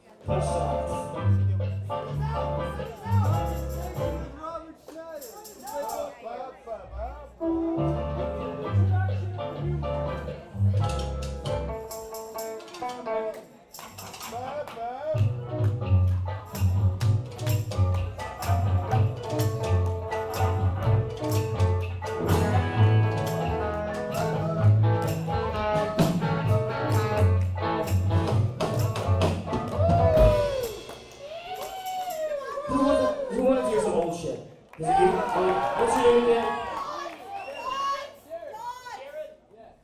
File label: tuning